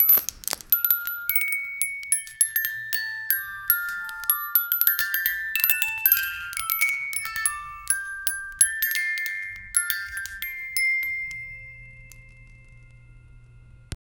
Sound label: mechanical old toy with g, toys